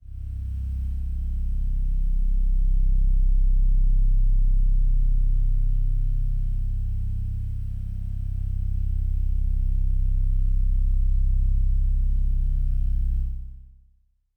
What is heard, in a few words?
Analog Strings Kawaii C0; pads